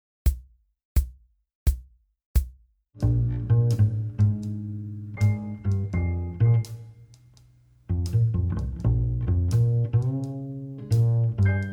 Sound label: bass i and iv swing; transcribing solos